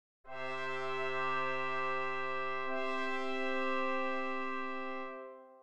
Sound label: 4-part brass; Nikolai Rimsky Korsakov